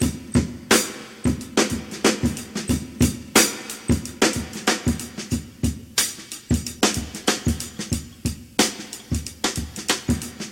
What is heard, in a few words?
wah, Boombap Drum loops